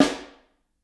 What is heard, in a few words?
SN3 Overhead, snare; Ableton Foundation FreePacks; Snare Designer